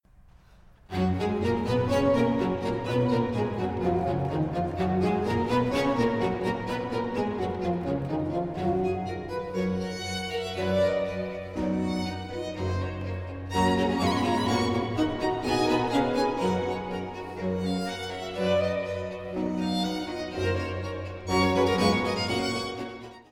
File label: G major for 2 violins and basso continuo Allegro